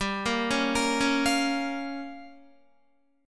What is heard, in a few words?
G Minor 7th Flat 5th, Guitar; Listen to Gm7b5 arpeggiated